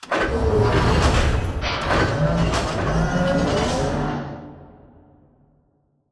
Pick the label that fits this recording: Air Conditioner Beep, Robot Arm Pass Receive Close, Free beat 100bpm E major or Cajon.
Robot Arm Pass Receive Close